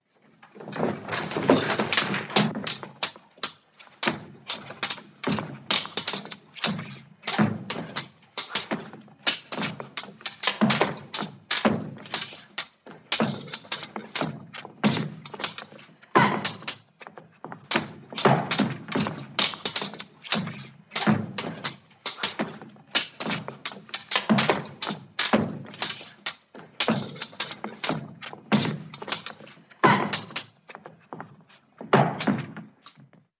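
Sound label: fencing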